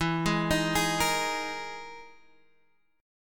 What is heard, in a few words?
E-Major Sharp 11th E, Guitar Half Step Down; EM#11